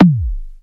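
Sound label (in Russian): кнопка клик резкий шумный низкий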